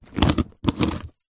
candy shop boiled candy tub plastic lid remove

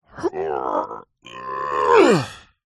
human male voice grunt groan lift heavy weight

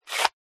foley wood secret compartment small lid slide; zapsplat

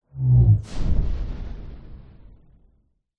science fiction missile whoosh pass by hit

